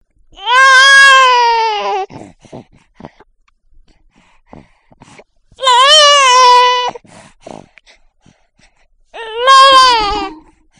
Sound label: baby